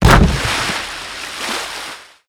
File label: zodiac crash impact; Call of Duty Modern Warfare; physics